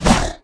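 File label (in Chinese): Bear atk, 熊灵布鲁斯攻击音效; 妮塔; 荒野乱斗; 熊灵会用爪子攻击对手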